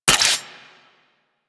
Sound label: Garuda Crossbow Mech C; weapons